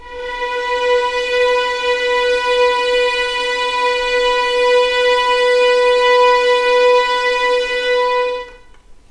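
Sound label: B4 legato mf violins L; East West; The Ultimate String Collection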